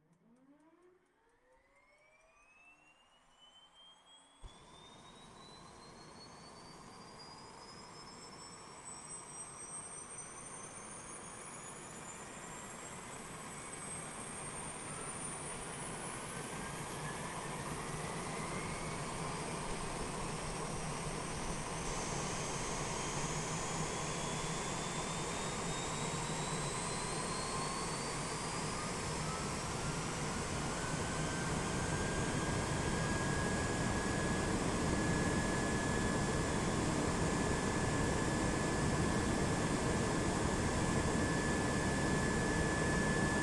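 beechcraft king air 350 onboard idle start idle stationary low to high rpm off left engine; sonniss gdc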